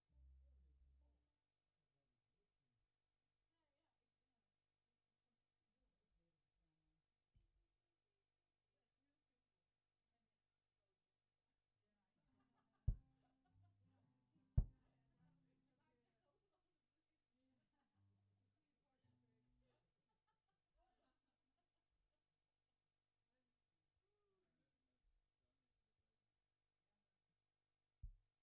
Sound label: Kick